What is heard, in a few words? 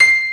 GRAND MF C6, PIANO